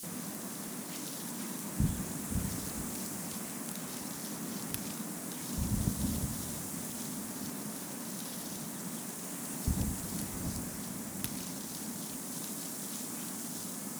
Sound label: Ambience trees